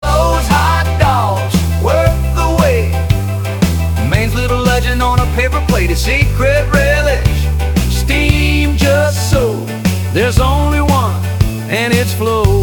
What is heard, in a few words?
ringtone; flo s hot dogs